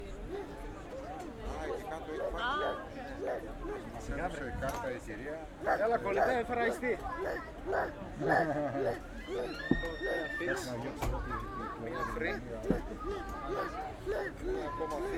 Restaurant ambience, walla, conversation in different languages, kids playing; Sound Ex Machina; Mediterranean Summertime